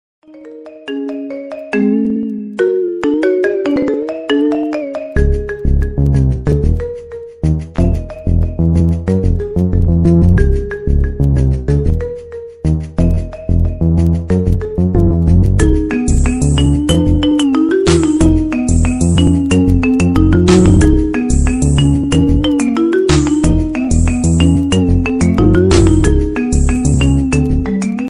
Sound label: Marimba Remix iPhone Ringtone; iPhone 13